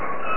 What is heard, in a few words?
flag Pull; darkmelt; Puzzle